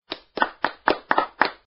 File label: clapping